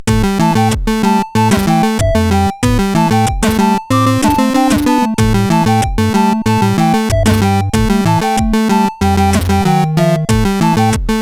sid CSG8580R5

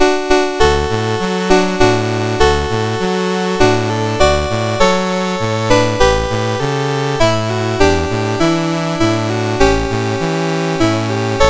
Brahms Lullaby, MOS6581R2

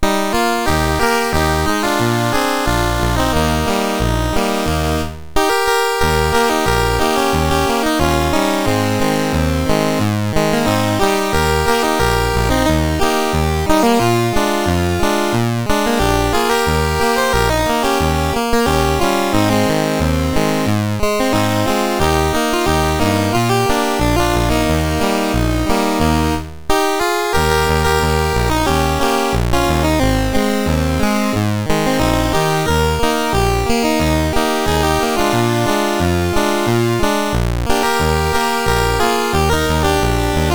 Singing Doors, MOS6581R3; Tom B24

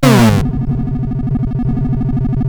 Die Alien Slime, CSG8580R5

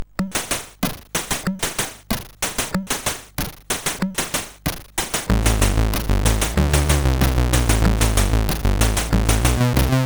Bad Drums, CSG8580R5

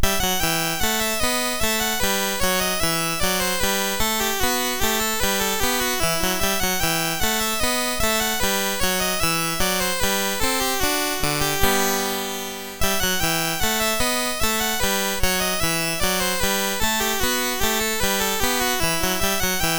Bourree, MOS6581R4

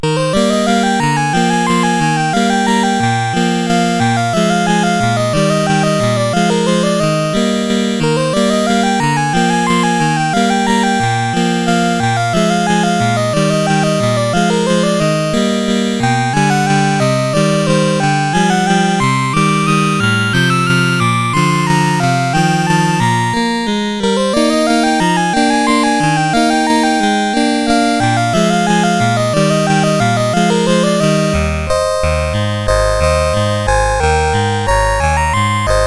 Waltz in G, CSG8580R5

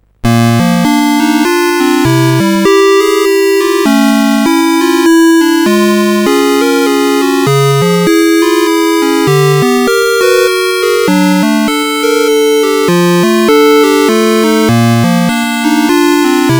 Musicbox, CSG8580R5; hvsc

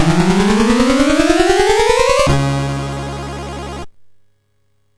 sid MOS6581R4; Escape from the Planet of the Robot Monsters

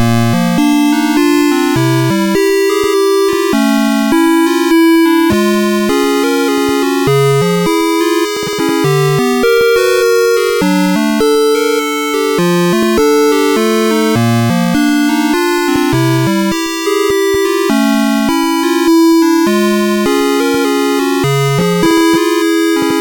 Musicbox, MOS6581R3; hvsc

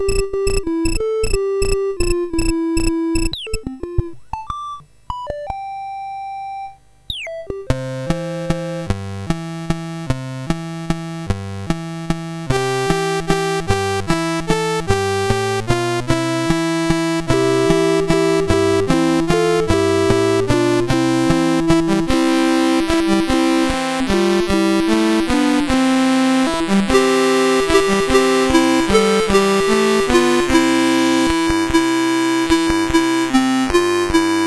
Whippoorwill, MOS6581R4

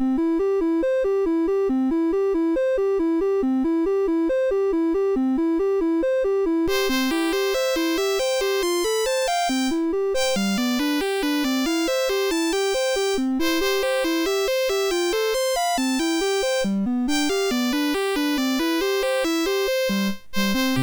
MOS6581; MOS6581R3; Big T Cat

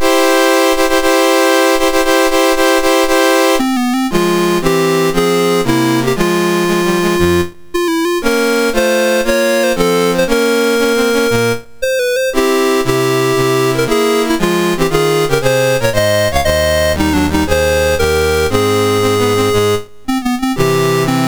Turkey, National Anthems, MOS 6581; MOS6581R2

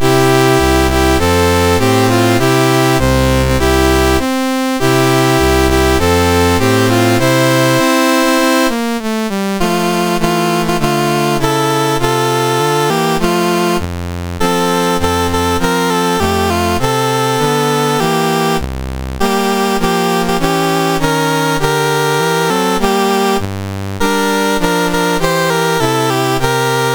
MOS6581R2, National Anthems; Niger

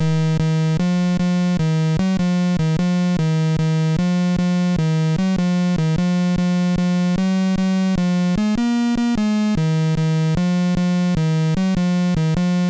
sid, MOS6581R4; Blueprint

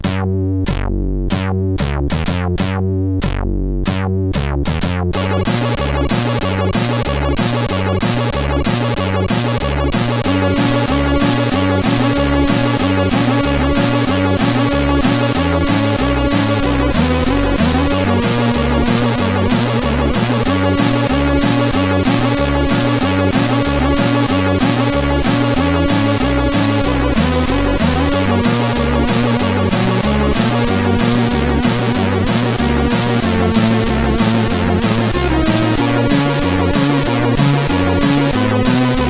Jumping Jack, CSG8580R5; Andy

